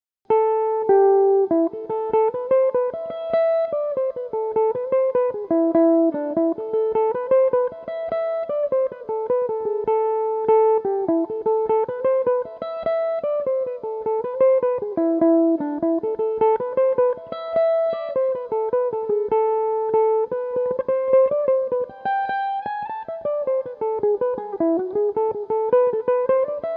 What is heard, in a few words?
coleraine jig amin, mandolin